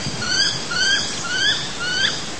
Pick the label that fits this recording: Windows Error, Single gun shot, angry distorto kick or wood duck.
wood duck